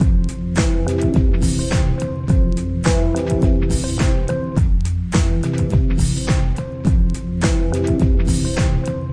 105 full melody harmonic drums; SAMPLED MUSIC LOOPS

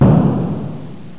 BOOM, video games; blockade

